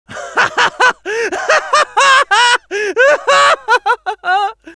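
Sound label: laugh long, French; Scout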